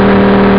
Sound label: car; arcade; marathon